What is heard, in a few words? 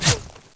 whizz